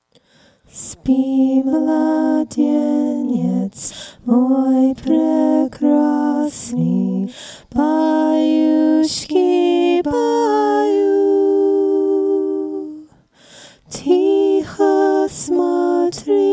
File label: cossack lullaby